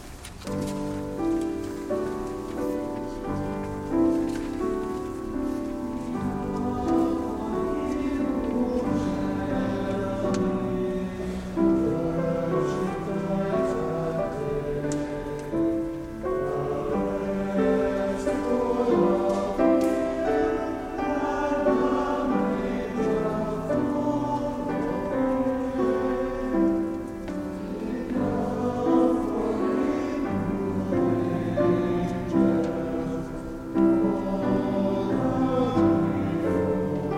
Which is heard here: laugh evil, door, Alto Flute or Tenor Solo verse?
Tenor Solo verse